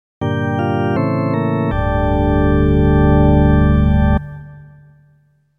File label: lose